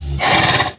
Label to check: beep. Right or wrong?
Wrong